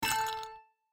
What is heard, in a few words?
Chip Destroying; My Cute Commissar